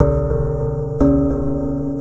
BASE, ELECTRONC

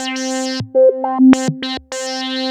C4, VCF; CMP - Analogon; SH-VCF

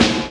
Snare, Percussion Hits, Snares; Zero-G Jungle Warfare 1, 2 & 3; 90 s Sample CDs

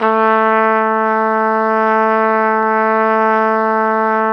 Flugelhorn; Roland L-CDX-03 Disk 2

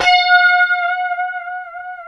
F#5 strat, Guitars; HYPED STRAT; Spectrasonics; Hans Zimmer Guitars VOL-1; 90's Sample CDs

